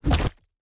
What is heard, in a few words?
ui construction plan place